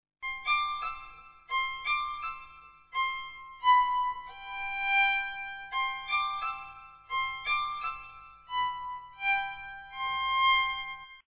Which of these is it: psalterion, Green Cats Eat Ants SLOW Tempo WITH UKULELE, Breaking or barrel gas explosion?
psalterion